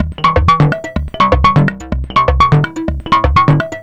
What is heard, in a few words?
synth 125 distort C Maj; Transmission-X